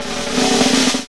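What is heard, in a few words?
SNR snare, Hip-Hop Rap Kit; Northstar; Drumscapes Roland; Rap Kit 3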